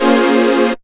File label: A#m7, Chords; Electronisounds